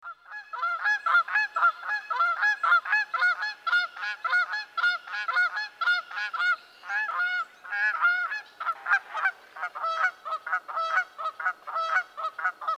canada goose pair

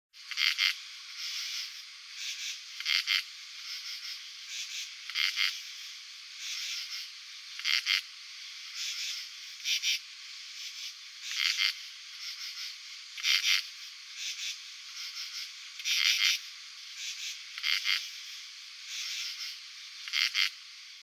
northern true katydid male cold

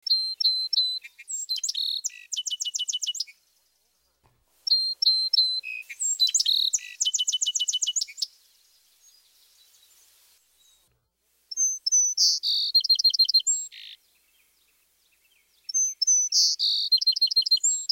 song sparrow

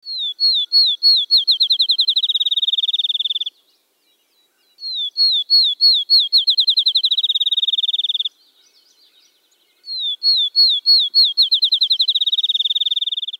field sparrow

